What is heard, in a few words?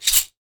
Salt Shaker, household rhythm samples hits